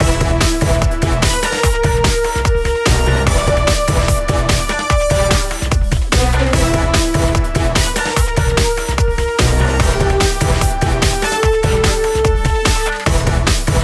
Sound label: Music Free Spin Loop; Mahi Gaming